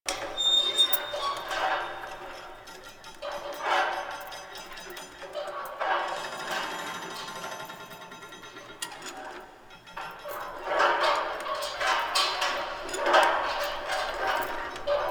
Hub Bird Cage Loop; Warframe; Ambience; Entrati

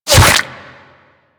Bullet Flesh, Impacts; Warframe

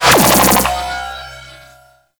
jamming radar ring; Warframe; Missions; Railjack

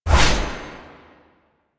Blade Swipe; Weapons; Melee; Warframe; Dagath

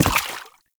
Step Water, Foley, Footsteps; Enemies; Warframe; Lasrian Soldiers; Lasrian Butcher

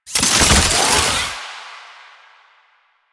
Soldier Zipline Start; Enemies; Soldiers; Warframe; Lasrian

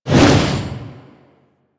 Spear Throw Fire; Weapons; Warframe; Tenno; Priest Spear

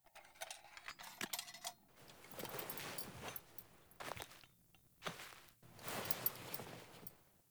Foley Stand Up Unarmed; Midrath; Dendria; Sit Spawn

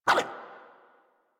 Puppy Idle Bark; Enemies; Vocals; Kubrow; Warframe

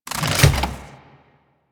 Gun Reload; Warframe; Thano Tech Arch Gun

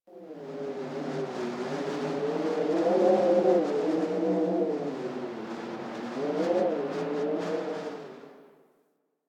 Landscape Cave Wind Howl, Ambience

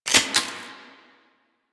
Mech; Warframe; The New War; Quests; Weapons; Omicrus

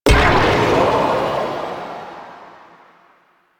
Heal Wave Cast Burst; Warframe; Anima; Anima Animus

